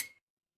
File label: glass clink